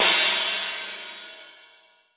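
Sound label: BIG L R, cymbal; CYMB.HH; East West; Global Sounds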